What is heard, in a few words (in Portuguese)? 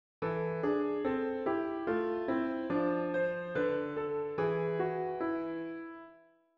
Pequeno livro para Piano; Bach; para Anna Magdalena Bach